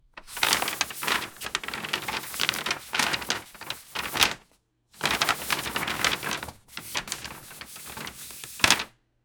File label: household